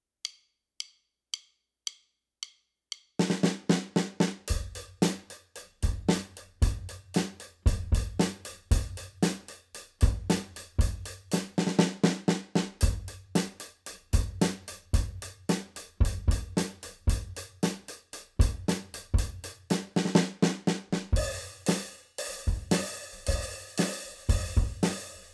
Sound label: hat Snare Bass, mahogany drum kit; all Eq